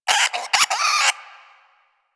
Vocal Alerted, Hunting Critters; Warframe; Cryptilex

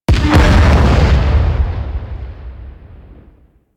Enemy Ship Destroyed Explosion; Rail Jack; Corpus

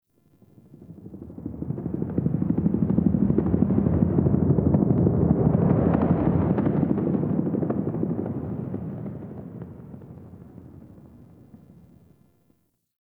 Distant Ambient Helicopter

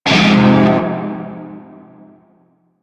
Shawzin Phrygian Chord G; Music; Warframe; Narmer Shawzin

